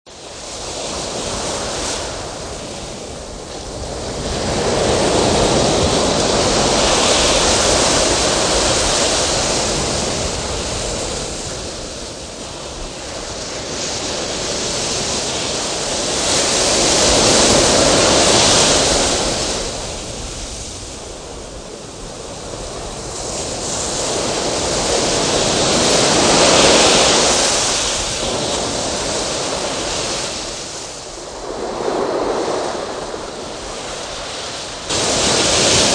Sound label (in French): mer, La mer